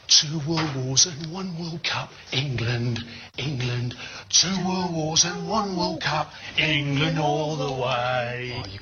british football chant two world wars and one world cup